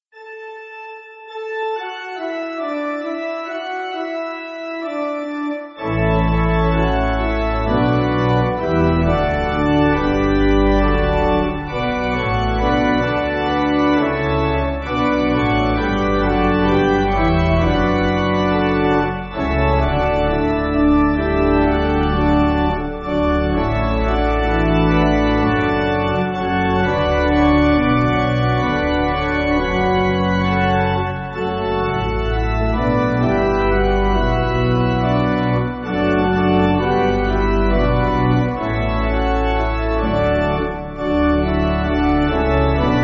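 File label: Pipe Organ, church backing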